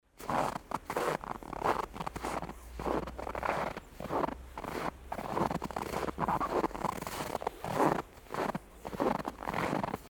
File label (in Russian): zvuk snega